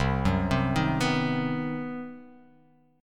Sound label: C Minor Major 7th Flat 5th, CmM7b5 chord; arpeggiated; JGuitar